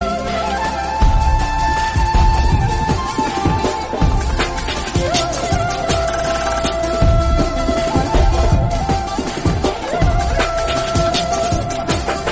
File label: Flute Bgm Ringtone; Paagal